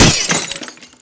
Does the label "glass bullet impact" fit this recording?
Yes